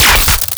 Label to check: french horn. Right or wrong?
Wrong